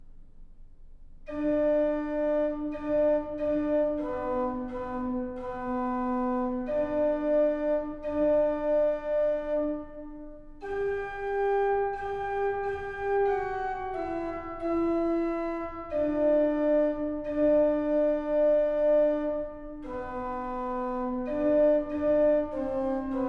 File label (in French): alto nous chanterons pour toi; chorale valognes